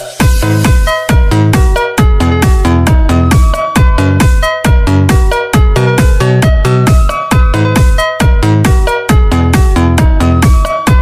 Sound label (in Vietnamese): Remix Melody Khong Loi